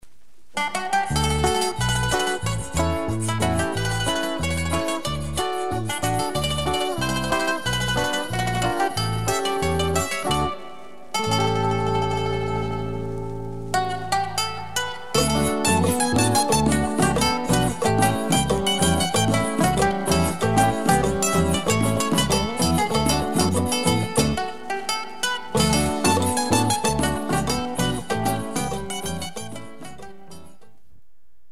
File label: mandoline danza